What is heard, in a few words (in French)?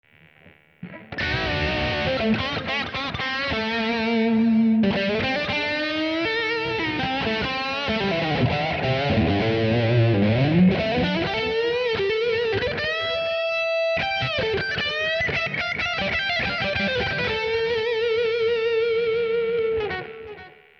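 TTop - saturation, micros montés en position bridge, Gibson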